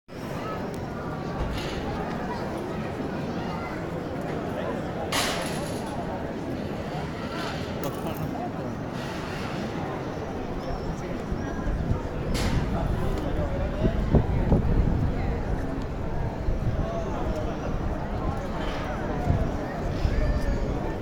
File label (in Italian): Antropofonia; ecos paesaggi sonori negli ecomusei